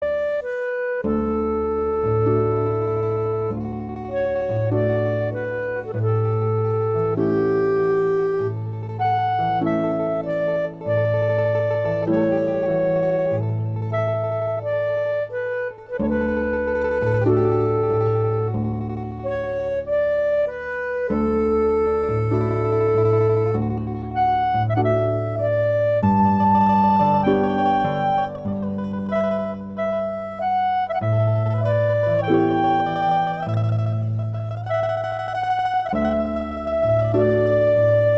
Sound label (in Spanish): MÚSICA PARA UNA CENA ITALIANA ITALIAN RESTAURANT